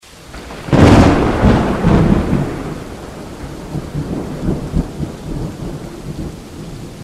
thunder

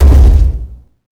step, baron of hell; doom4

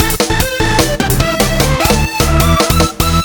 speedup; TF2Ware

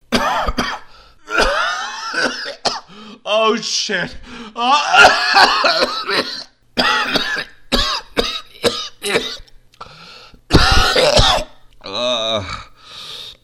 vomit

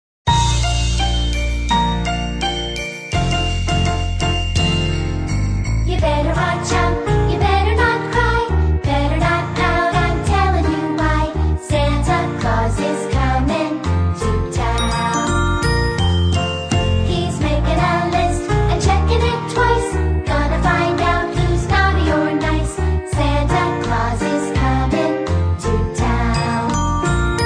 bgm; santa

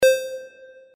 hitsound; hl2dm; oppressive territory

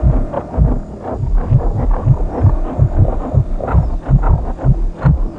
aim mask sound

